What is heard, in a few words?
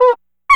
clown horn dampened short; Cartoon - film effects